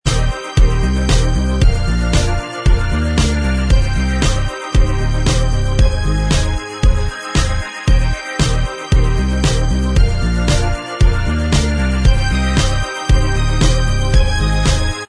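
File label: instrumental track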